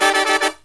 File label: low chord, brass riffs